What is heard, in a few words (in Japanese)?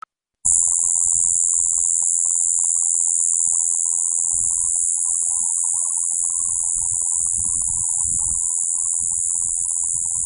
kubikirigisu; ヤブキリ